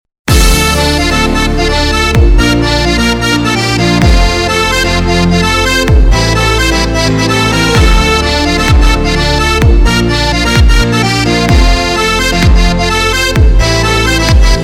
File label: Korg Pa3X